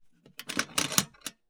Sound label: Part Assembly; TOOLKIT vol.2